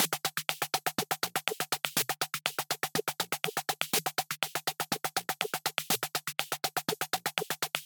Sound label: 122 BPM Micro Drums; MMB Studio Melodic